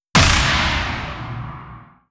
shockwave shoot layer

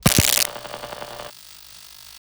headset impact, radio; props; alex radio

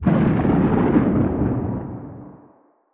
thunder; Half Life 2; Episode 1; outro

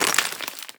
debris concrete, bullet impact debris

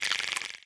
slobber, clams; infestation

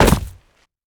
footsteps land basket; player

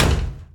metal door full open; doors

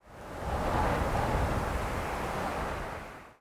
wind gust; Counter Strike Model Pack; CS:GO

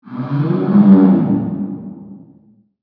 town moan, creatures; Half Life Model Pack; Half Life 2

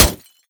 manhack, bullet impacts; physics; Half Life Model Pack

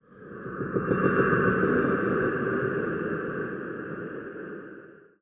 amb prepper rhythmic, ambient

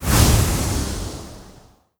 dash start, player, dash; Half Life Alyx

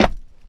metal solid, footsteps

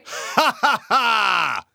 taunt haha; Counter Strike; CS:GO; taunts

